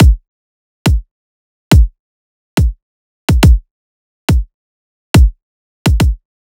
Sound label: Riddim Drum Loop Kick (140); Drum Loops; Ghosthack; Riddim Essentials 2020